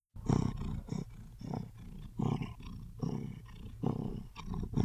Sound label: cat purr